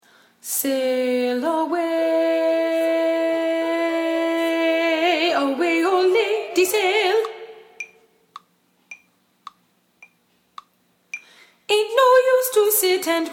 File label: Alto